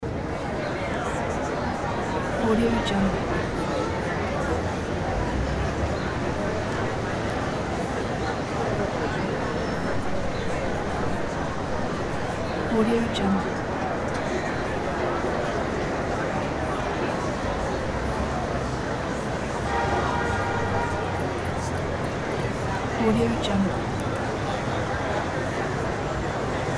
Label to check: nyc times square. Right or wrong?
Right